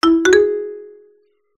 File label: Message, notifications